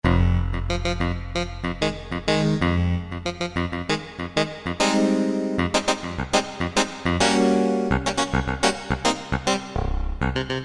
Clavi; Factory Presets

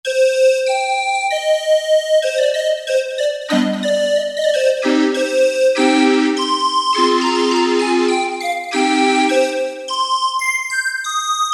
Calliope, Roland U-220; Factory Patches

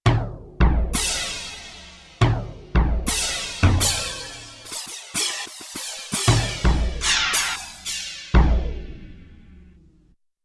Flanger&Chorus, Cymbals & Bass Drum

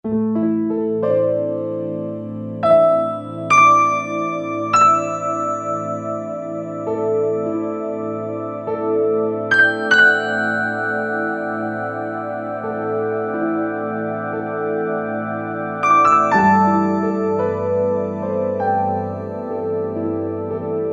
New Age Piano

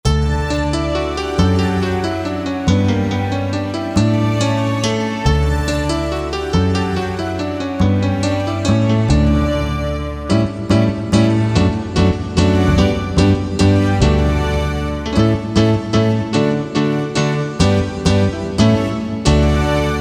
Korg MSC-8S Percussion; Concerto No. 1

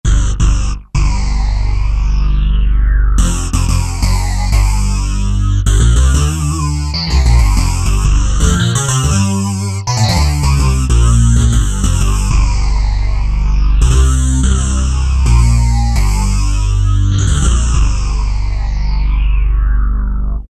SYNC BAS, Roland SC-880; Factory Patches

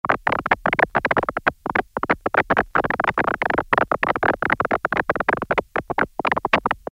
Hand Claps, Roland Juno-106; Factory Preset

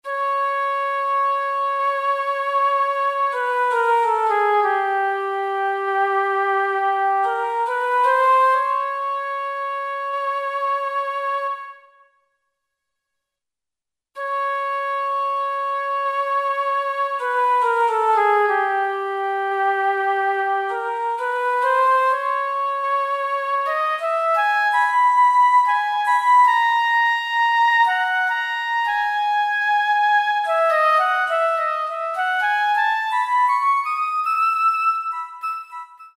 Flute, Roland SR-JV80-02 Orchestral

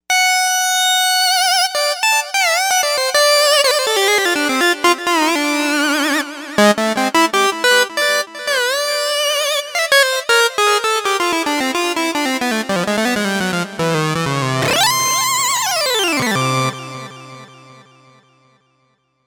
Jazzy Lead, Novation UltraNova; Factory Patches

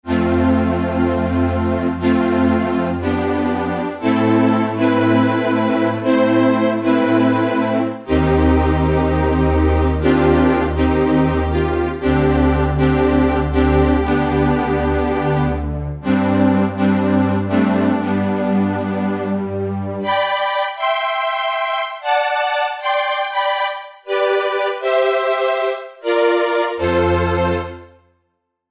Matrix Synth

